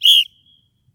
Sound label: shrill whistle